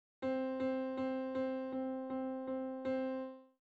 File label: Quarter Notes in Presto 160